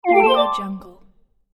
soft analog synth video game confirmation menu sound